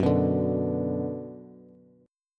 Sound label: Gb Suspended 4th Sharp 5th Gb, down, Bass; strummed; JGuitar